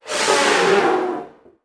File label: perk vampirism; World of Tanks Blitz; GUI halloween 2018